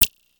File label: click